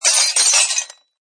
Dishes Break, Dishes break, glass and porcelain crash impact; Breaking (Sound Effects:Objects)